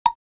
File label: Click error